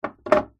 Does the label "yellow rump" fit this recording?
No